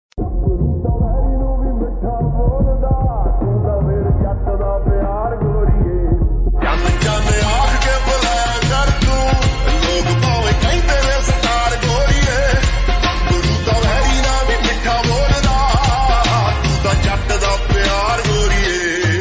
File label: slowed reverb